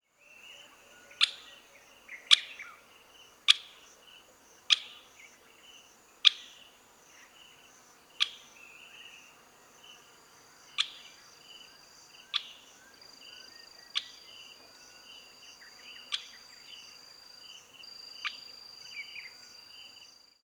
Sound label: Accipiter tachiro; roofvogels; Accipitrinae; Afrikaanse havik; Accipitres; Accipitidae